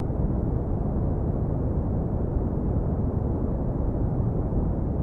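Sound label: Space Exterior Ambience, sci-fi ambience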